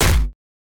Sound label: punch heavy